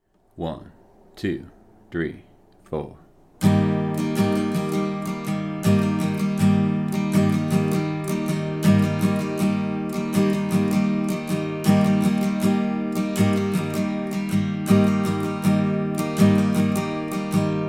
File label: strum pattern